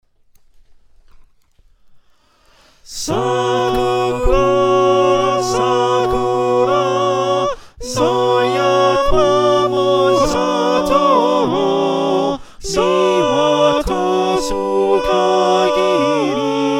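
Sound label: soprano alto tenor bass, choir; Sakura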